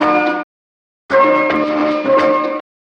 keys

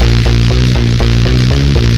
insect swarm; Loops; Electronic

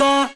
autotune, Voice

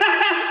"ha ha", Voice; ooh ahh

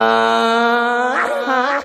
aaaaaaahhhahha; Voice; ooh ahh

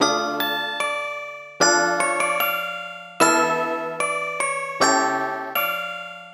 keys sadness, Loops

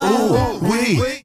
"ooh wee"; Voice

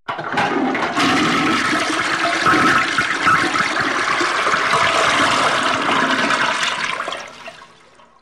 toilet flush

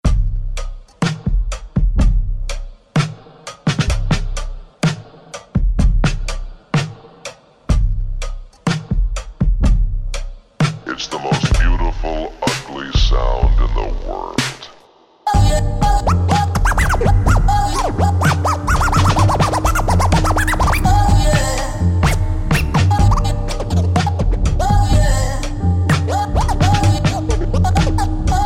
Scratches; Burntablism Volume 2

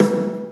bunz, snares real

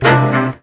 two more notes

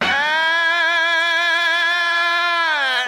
"ah", SUNG; ooh ahh

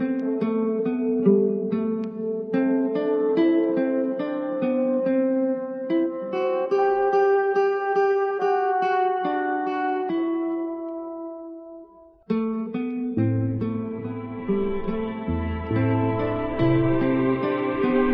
guitar and strings sad, Loops

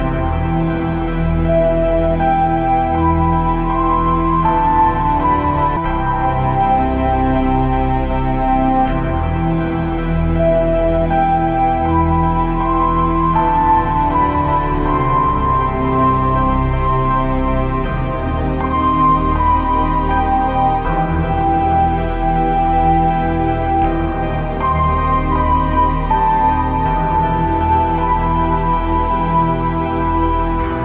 long keyboard piece

